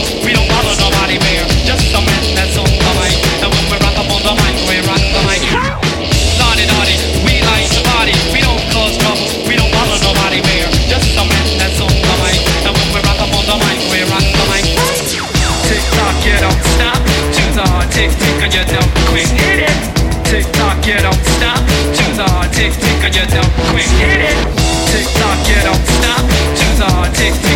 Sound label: Break